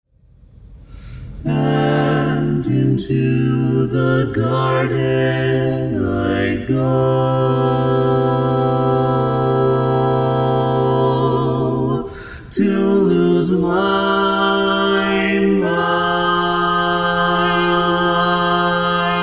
Bass

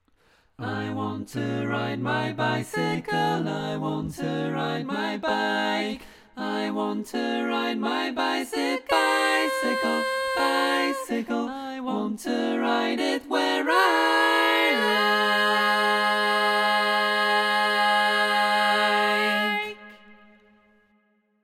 Bari, barbershop

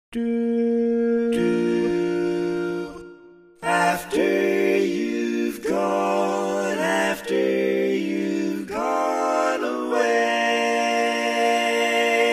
Bari, barbershop

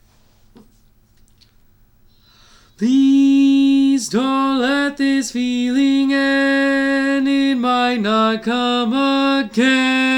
Bari, barbershop tags

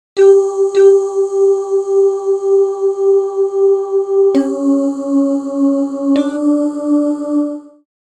barbershop tags

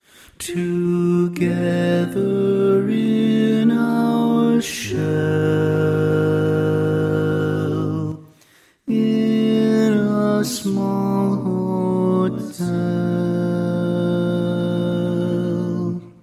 Bari, barbershop tags

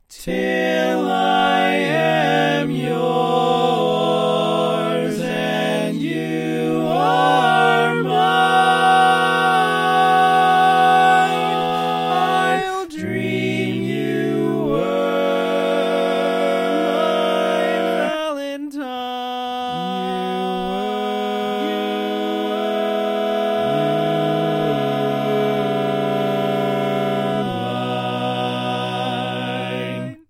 All Parts, barbershop

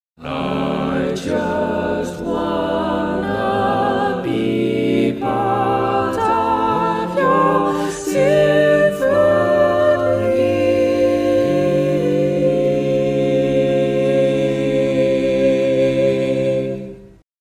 All Parts, barbershop

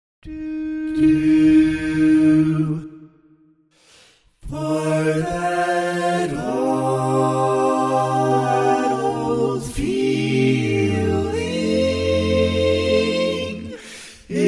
barbershop tags, all parts

